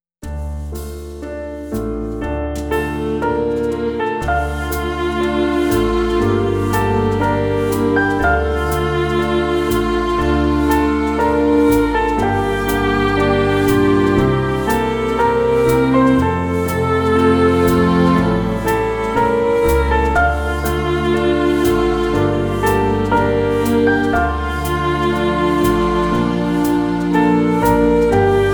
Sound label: Historical theme Sample; Mata Hari